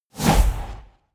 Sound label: whoosh